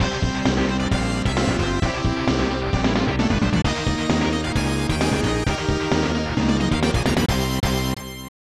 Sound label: Congratulations!; Zen Nihon Pro Wrestling 2 3.4 Budoukan; snes